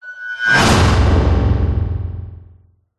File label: whoosh; Audio Mangler